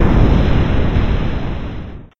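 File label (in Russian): взрыв с затуханием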